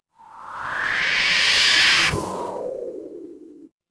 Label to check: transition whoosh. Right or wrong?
Right